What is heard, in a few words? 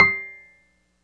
PNO Wurly; Keyboards of The 60's and 70's